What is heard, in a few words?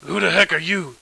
alert